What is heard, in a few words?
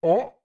Gasp; Goron Link's gasps after a big jump